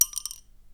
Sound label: Pencil Drop